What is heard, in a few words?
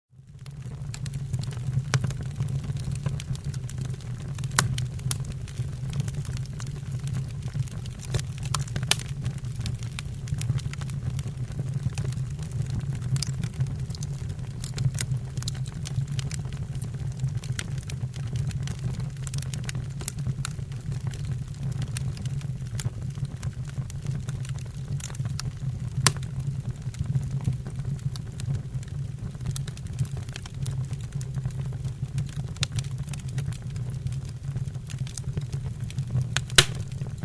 Firewood burning in the fireplace